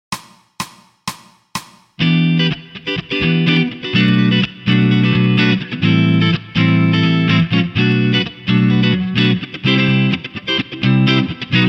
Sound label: Improvised Rhythm Guitar Playing Example, electric guitar; electric guitar performance diploma